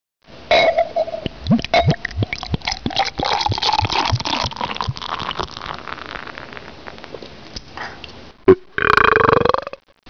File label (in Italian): Dopo birra